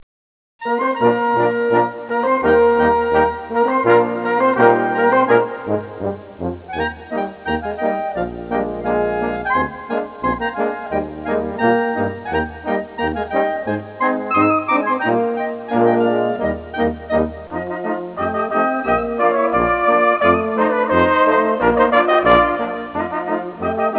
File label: Polka